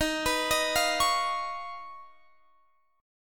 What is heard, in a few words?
D sharp 7th Suspended 2nd Sharp 5th D sharp, Guitar, Half Step Down